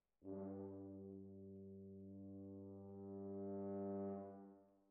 ORTF, Jazz for piano

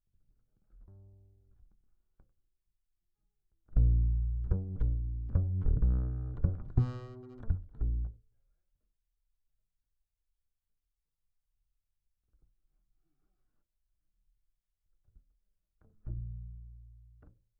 Bass DI

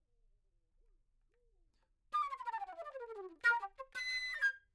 Flute

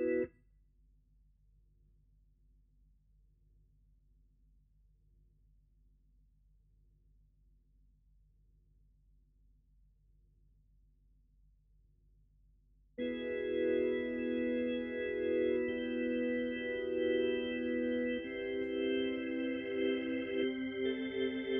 Hammond

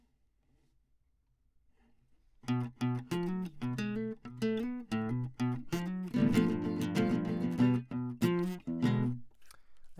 Classic Guitar

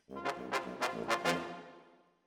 Brass

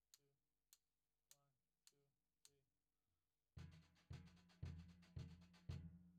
Floor

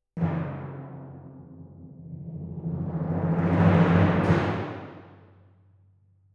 Timp

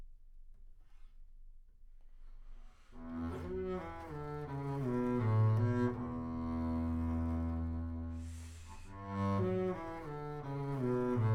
Bass Room

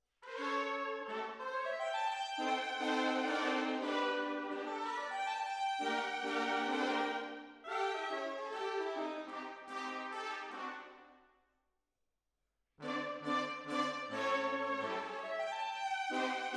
Hall, Jazz for piano

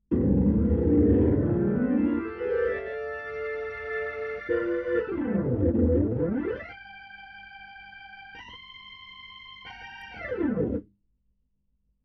HAMMOND BOT

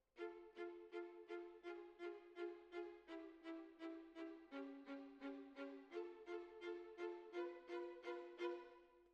Cellos

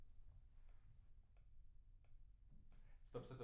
PIANO

